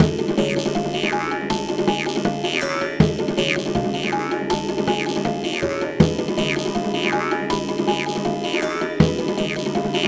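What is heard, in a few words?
Bomb Enemy Fight Variation Loop; Birdhouse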